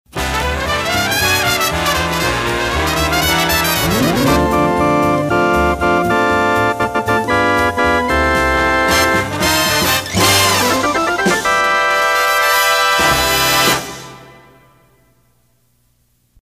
Swingin Organ; PAMS; WIBG

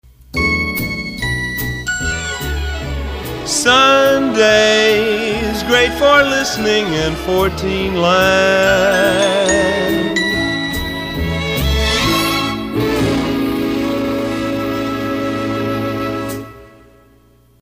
Weathermation Sunday; KQV; PAMS

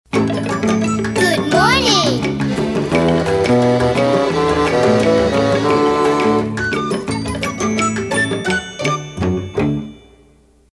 Short Sig; WPOP; PAMS; Good Morning In Style

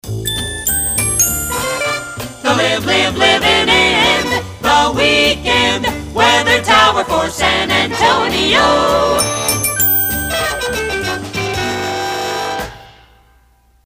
Weathermation Weekend; KONO; PAMS

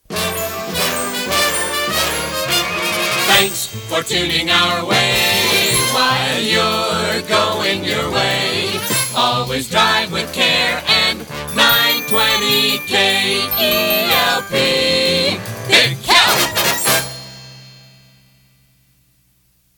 Drive With Care; KELP

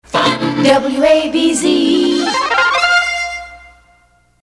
Vocal Coloursonic ID; WAVZ; PAMS

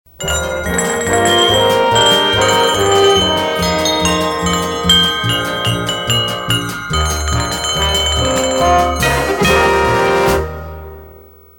Bell Sig; PAMS

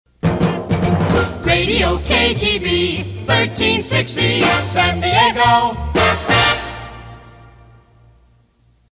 Short Sig

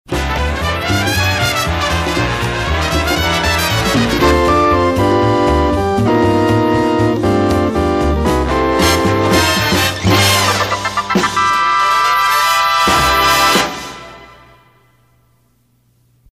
Swingin Organ; PAMS; WABQ

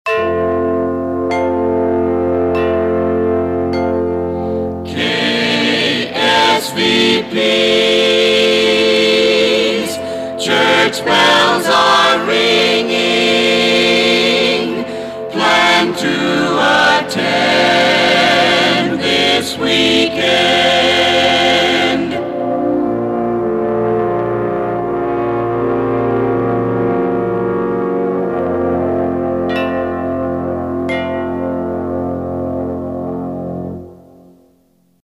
Church Bells; KSVP

